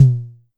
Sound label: Drum X Tom, Pearl